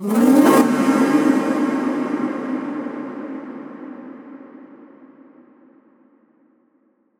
Atonal Depths, Psytrance; Ghosthack; Psytrance Visions Volume 2

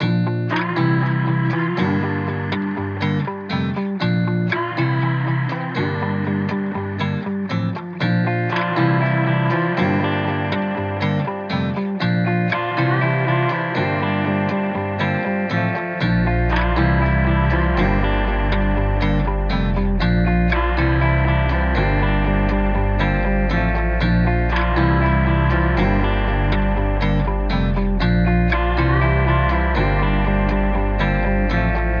120 BPM A# Maj, Guitar; Frail; Cymatics; Miami Guitar Pack - Pt 1

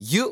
Dry Chant - "Yuh"; Cymatics; Euphoria Vocal Sample Pack; Chants

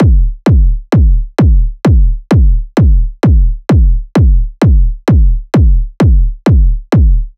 Kick Loop 130, Techno

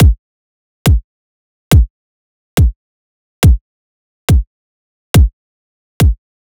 Riddim Drum Loop Kick (140)

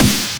Clap, Bonus Drums

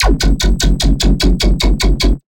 Dirty Bass F# 150, Bass Hits; One-Shots; Celestial; Ghosthack; Color Bass Essentials

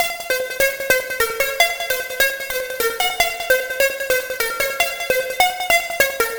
Arp Loop 150 BPM F Min, Dubstep; Arp Loops; Synths; Cymatics; Dubstep Starter Pack

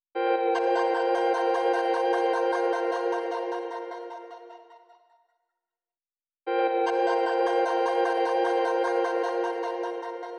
152 BPM E Min Synth Oneshot; Trap; Melody Loops; Loop Stems; Cymatics; Nightmares Trap Melody Loops; Firing Squad

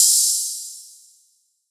Ride; Hats and Cymbals

